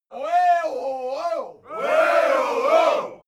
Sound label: native american shout